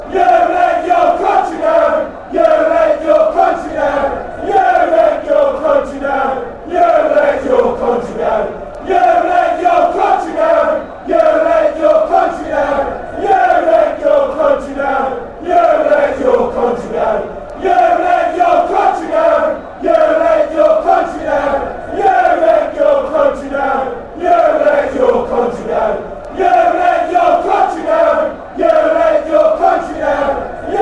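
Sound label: you let your country down, football chant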